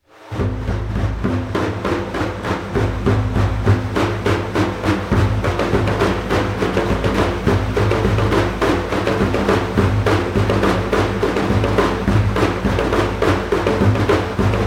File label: percussion